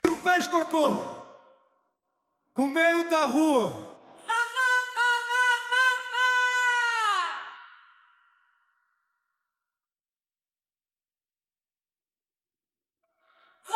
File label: Vocals